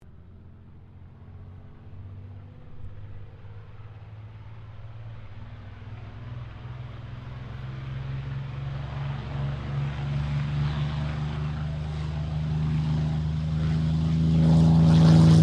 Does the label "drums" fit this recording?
No